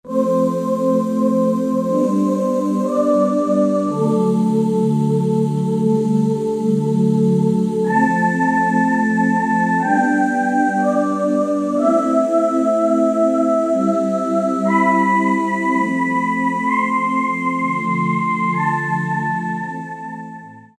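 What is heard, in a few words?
Ambient Voice, Korg 05RW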